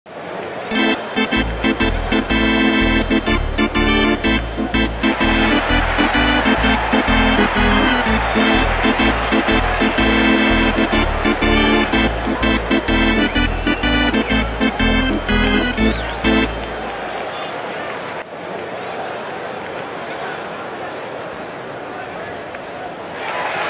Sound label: hockey stadium arena music classic hockey organ